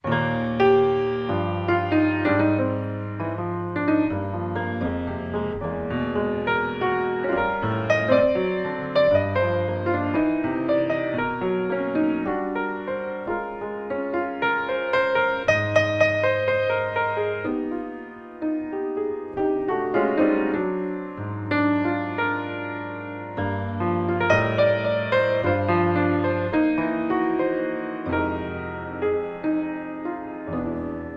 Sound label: Intro Solo Piano